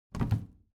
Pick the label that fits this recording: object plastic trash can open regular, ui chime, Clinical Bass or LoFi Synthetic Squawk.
object plastic trash can open regular